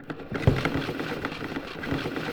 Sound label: REEL IN, household; AKAI Anime Sound FX